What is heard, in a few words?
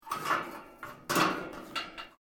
METAL PLATES MOVE; Metal (Sound Effects:Materials)